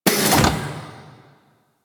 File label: Galleon Jail Door Close; Grineer Galleon